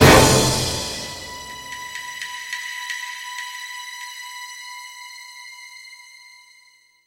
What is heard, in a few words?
Music Attack Sting